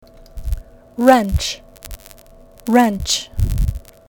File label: wrench